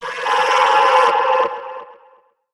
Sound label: drone call others